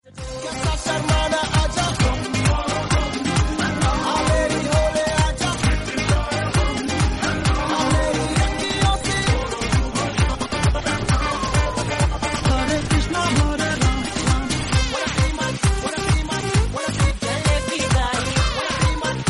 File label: bollywood remix song tone ringtone